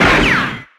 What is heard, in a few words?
Btl Gun